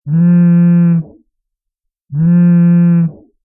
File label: ringing; winchester